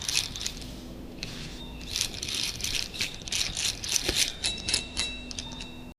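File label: paper rustling tiny hammer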